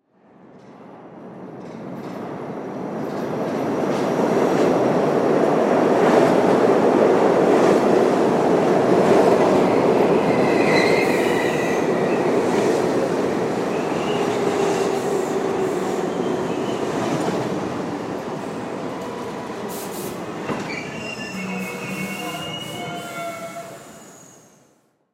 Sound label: Subway Pull Up Stop Brakes Squeak; Transportation; Subway Sound Effects; Subways (Sound Effects:Vehicles); Blastwave